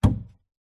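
household furniture large quality wooden sideboard door close inside unit pov; sidebaord; zapsplat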